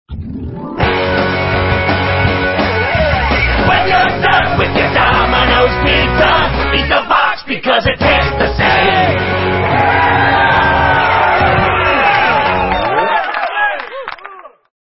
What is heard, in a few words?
Rejected Commercial Jingles; Domino's Pizza